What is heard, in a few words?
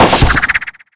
Present Box Take Hit; PresentBox SFX TakeHit 01